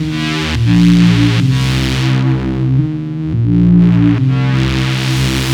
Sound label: Som Bass, Drum n Bass; KJ Sawka; Drum n Bass & Beyond